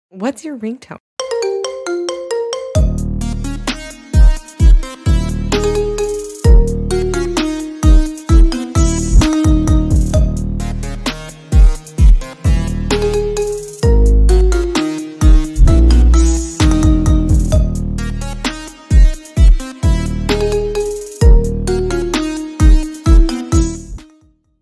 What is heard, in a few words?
BGM korean ringtone